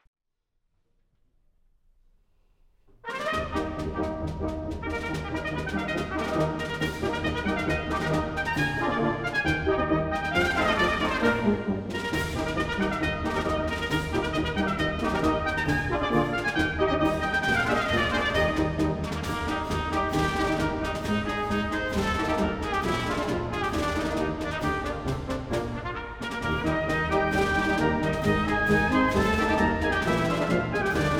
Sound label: Civil War Brass Band